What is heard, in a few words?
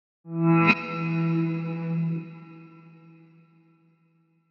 ding